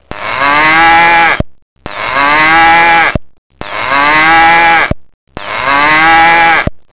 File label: cow